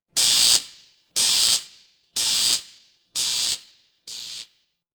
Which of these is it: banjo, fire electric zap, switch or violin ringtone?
fire electric zap